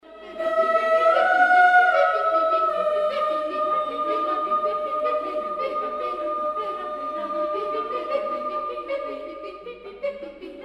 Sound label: mbira; choralworks